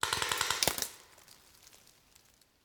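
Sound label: Paintball Gun Impact; Sound Ideas; Guns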